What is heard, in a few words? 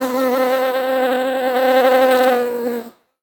Amb Animals; Red Orchestra Ostfront 41-45